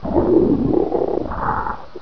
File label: alert